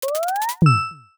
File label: drone target lock